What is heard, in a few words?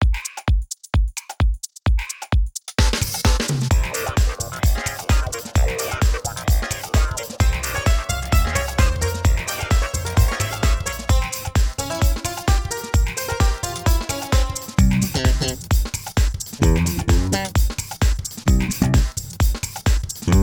funk india